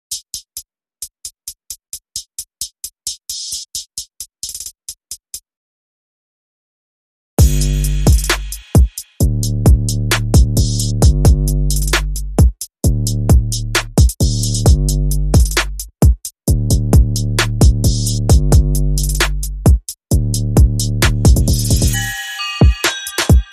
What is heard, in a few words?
No Voice Shouts No Brass Sample